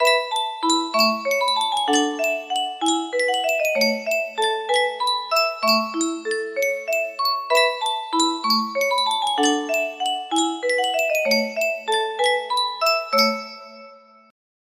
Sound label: Yunsheng music box carousel waltz